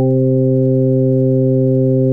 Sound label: JAZZER ORGAN; Zero-G; Funky Elements